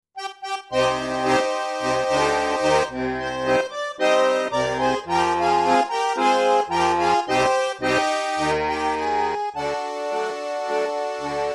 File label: bandoneon arrabalero, tango